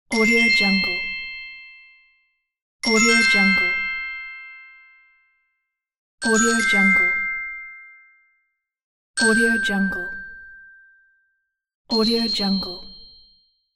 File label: good idea bright bonus; kuksaudio